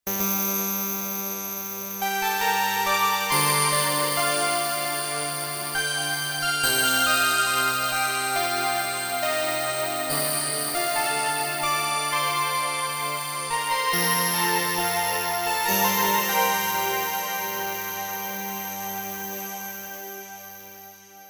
UDO Super 6 Prophet VS Stack

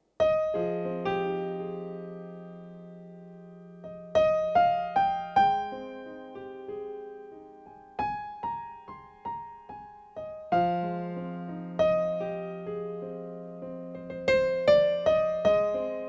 Concert Piano

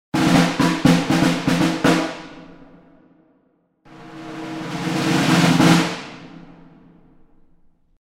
Snare Field Drum

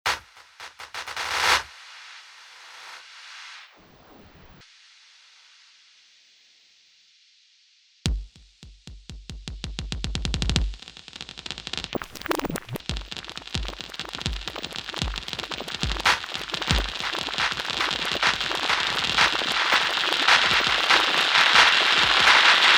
Angel Delay Feedback

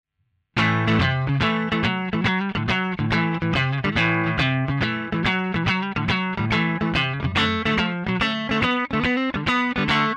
blues riff DI Balaced Out

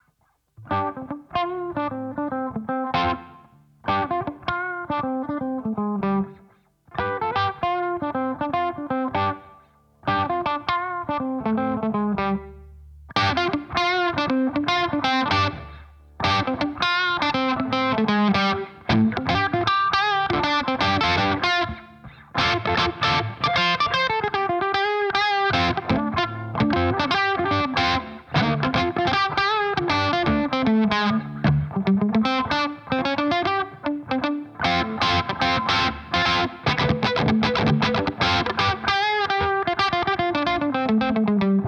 Channel B Boost

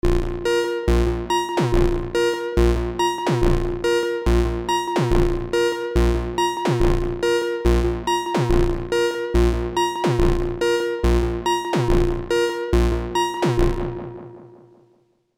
Moog short clean Delay Filter; T-REX Replicator Module

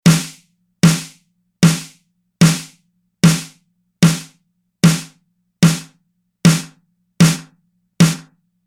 Parameter Strainer

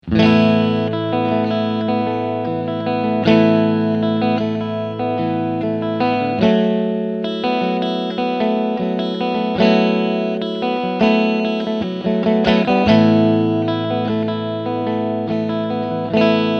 Bridge + Neck PU Clean

